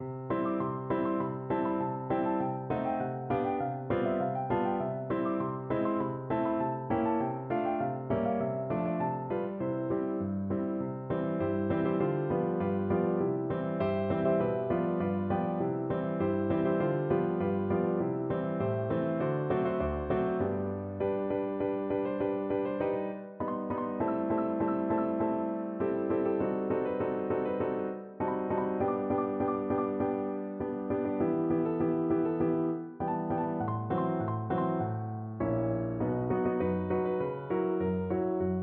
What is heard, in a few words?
three little maids piano